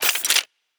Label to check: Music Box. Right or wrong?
Wrong